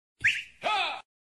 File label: ha; tf2; fit